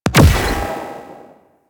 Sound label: Space Fighter Plasma Shot B; Space Fighter Plasma Agent; Weapons